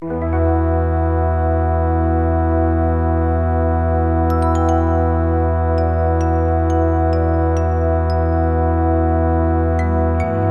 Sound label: sc55; roland sc-55; monkeyisland